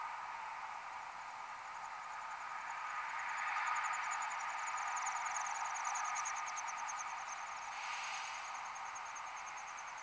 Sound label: Wing Whistle